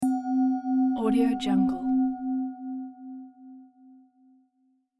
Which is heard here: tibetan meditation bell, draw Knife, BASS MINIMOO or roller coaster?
tibetan meditation bell